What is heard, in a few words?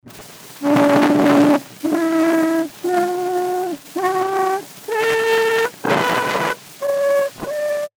Vocal Scale no crosstalk